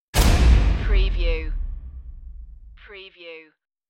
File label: the dark path horror stab